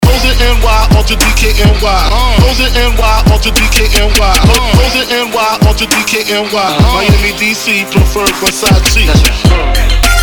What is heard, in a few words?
trap